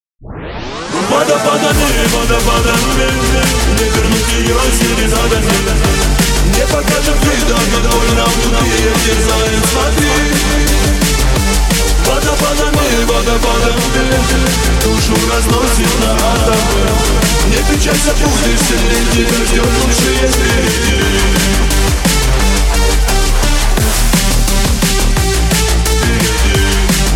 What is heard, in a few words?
mix DNB